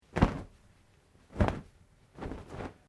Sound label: Cloth Medium Shake Sequence; Material components for constructing more complex sounds; Dressing Sound Effects